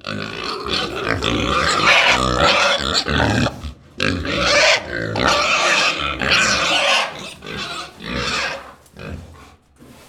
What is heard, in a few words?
pigs stable; animals; best service Blue Box